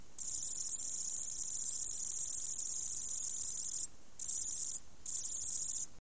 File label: Atlanticus testaceus; Arthropoda